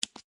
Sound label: Flashlight Click Mini; gamerip; Lethal Company